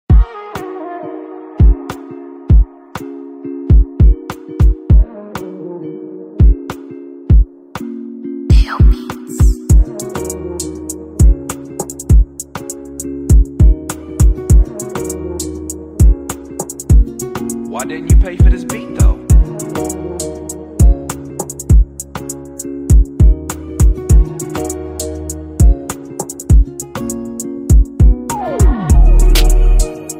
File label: type beat